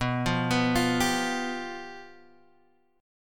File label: G 7th B